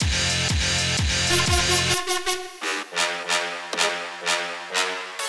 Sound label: Horns